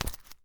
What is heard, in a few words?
wallet open